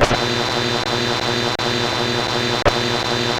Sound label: loading (loop); jsrf; shakesoda